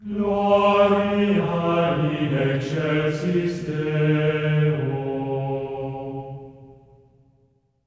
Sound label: GLORIA C#4, Symphony of Voices; Spectrasonics; GR GLORIA